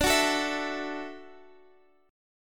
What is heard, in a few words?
E 7th Suspended 2nd Suspended 4th D